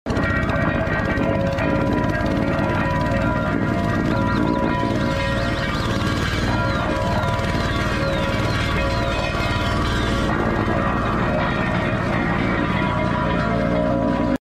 Road roller; غلتک آسفالت

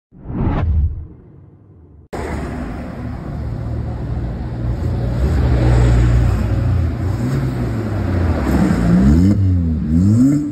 Chery Arrizo; ماشین

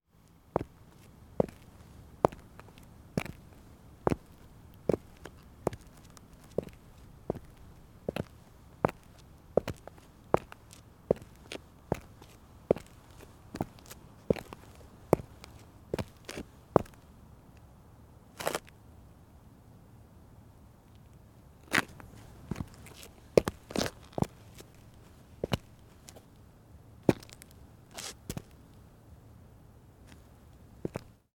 Sidewalk Sound Effects

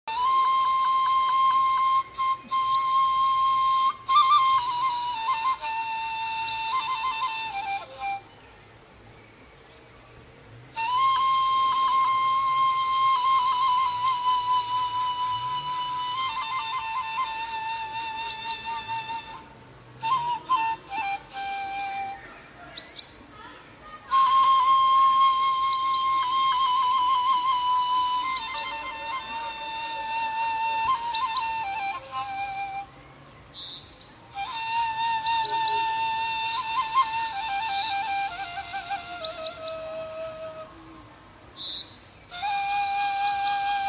ney taleshi; نی تالشی

